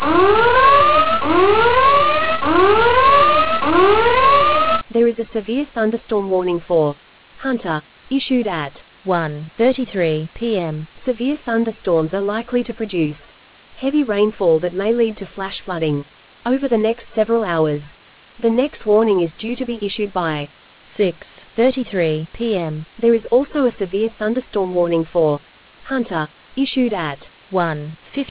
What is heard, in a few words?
storm audio